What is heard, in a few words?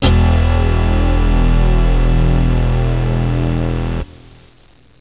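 SYNTH BASS E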